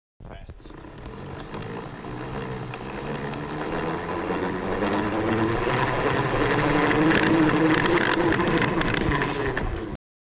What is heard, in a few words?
Gear wined snd